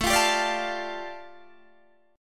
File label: A 7th Suspended 4th Sharp 5th A Guitar Standard, chord; A7sus4#5 strummed